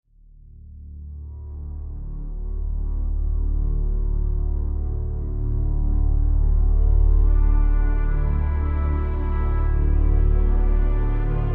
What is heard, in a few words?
moon temple idle; hero of sparta; iphone